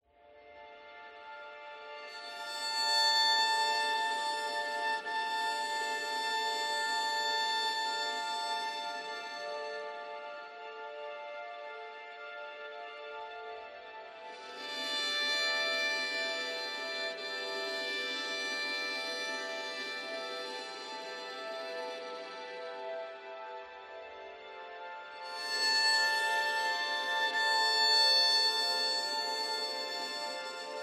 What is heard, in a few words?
Violin